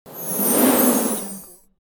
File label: magic whoosh